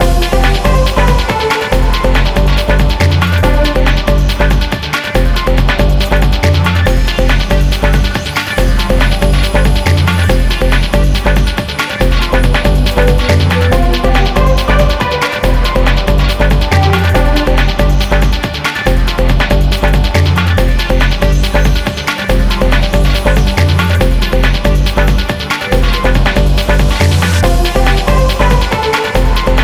Victory Fanfare; Starblood Arena

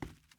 wood step; teardown ps5 windows xbox series xs gamerip 2022

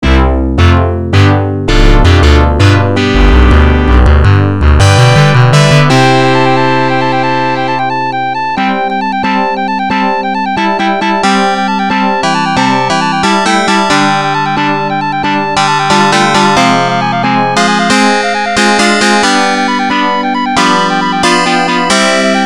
All Clear; Gun.Smoke

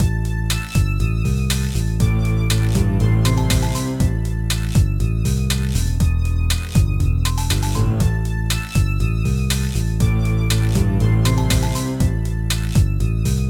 Pacific Percs; Kim Possible Global Gemini

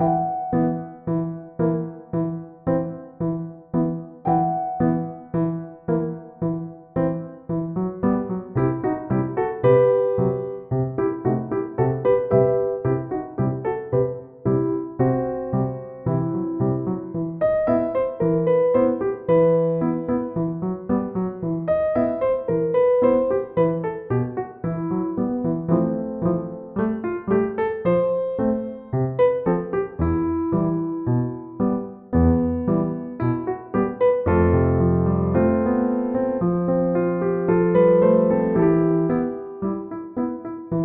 Piano; super neptunia rpg

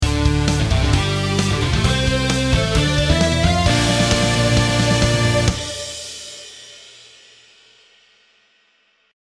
Stage Clear; BullShot

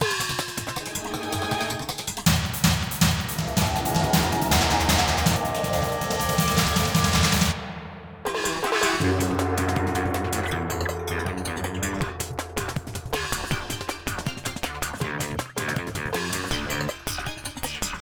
Hum Crash; Bolt

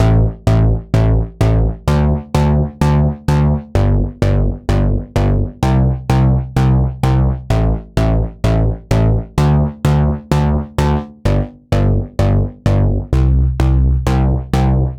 Poly Bass; super crush k.o.

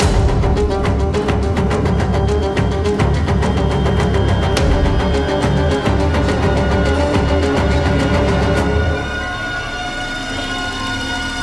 Combat

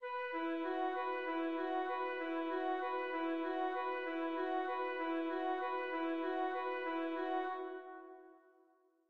Compass Woodwinds

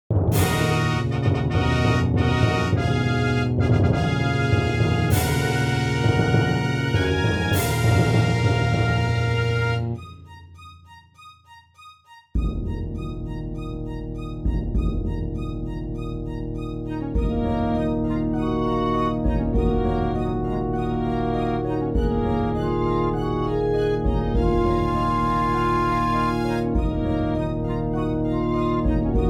Owl Song; Songbird Symphony

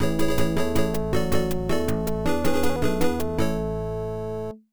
Win Arena Battle; hillsfar; pc 9801

